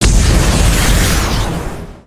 Asteroid Explode

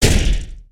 Spider Bang Cage; creatures organic

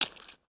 Foot Swamp Water Run, footsteps

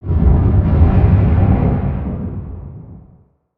Cave Ground Rumbles; ninjago

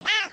Pet Cat Hurt; creatures pets

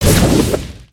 Fire Punch; Dragon

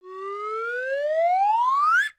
Rocket Fly Up

